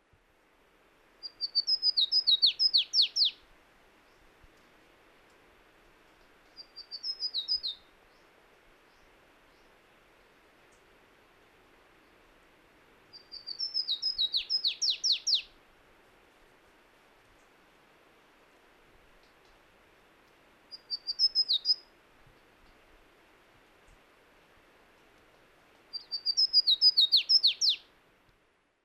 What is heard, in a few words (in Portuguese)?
Canário do mato Basileuterus flaveolus; Jacauna